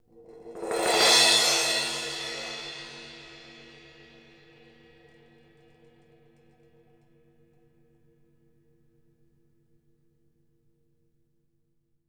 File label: MALLETS, CRASHES; Sampleheads; New York City Drumworks VOL-1; KD CRASHES